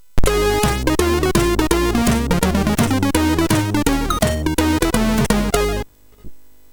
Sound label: Game Over, MOS6581; MOS6581R3; hvsc